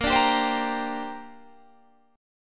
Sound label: A sharp 6th Add 9th